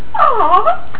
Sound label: jump; vortex; pretzel